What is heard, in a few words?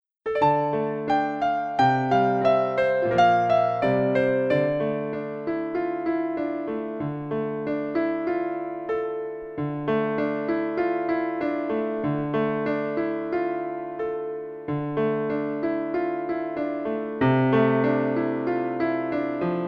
two canadian folk songs acc